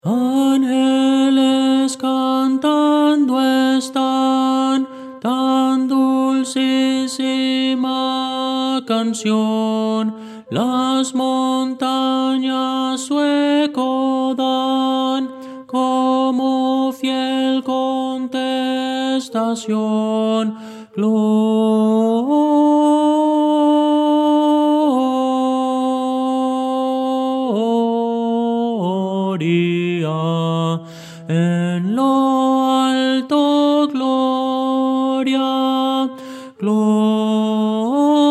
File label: tenor; Voces para coro